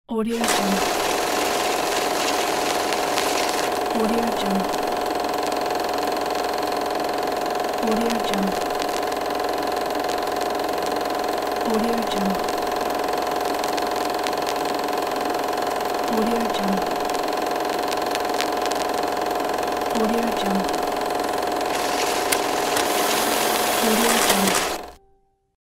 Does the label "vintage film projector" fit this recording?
Yes